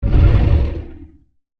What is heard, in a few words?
Dinosaur Breath, Animal, Dinosaur, Breath, Creature; Dinosaurs (Sound Effects:Animals)